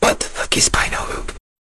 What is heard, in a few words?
jingle